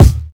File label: bumpin kick drum single hit c key, kick single shot tuned to the C note